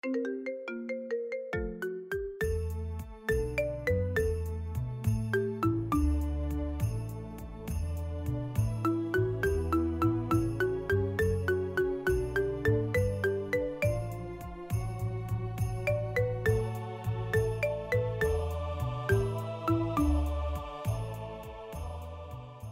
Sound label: Marimba Remix